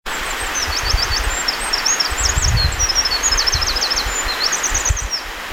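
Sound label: misosazai, wren; bird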